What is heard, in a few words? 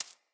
Sparrow Seed Feed Peck; Animals